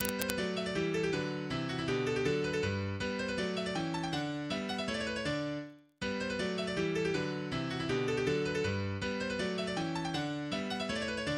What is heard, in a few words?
Piano Sonata